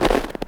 snow; svencoop

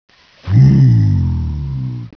zombie alert; svencoop; hunger; hungerzombiecop

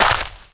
dirt; svencoop

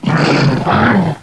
shock trooper attack

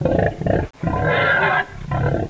voltigore communicate

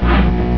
miss; svencoop; weapons

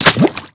slosh; svencoop

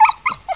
pain; hunger; hungerhound

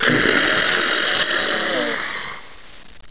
kill; svencoop; hunger; hungerbarney

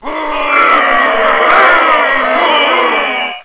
crowd; svencoop; hunger

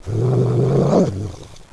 pit drone hunt; svencoop

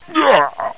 pain; svencoop; hunger; hungerbarney

